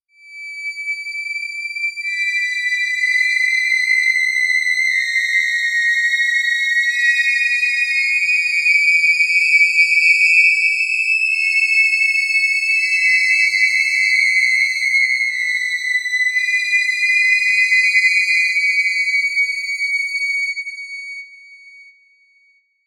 Very high pitch sustained tones, Miniature Theremin; ringtones